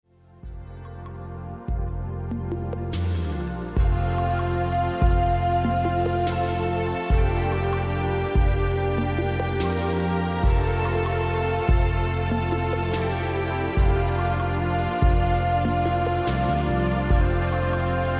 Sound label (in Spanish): Musica para relajarse y sentirse bien